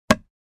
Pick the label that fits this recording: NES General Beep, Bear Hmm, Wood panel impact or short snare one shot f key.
Wood panel impact